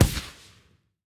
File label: win Symbol Flash Add On; fairyhansel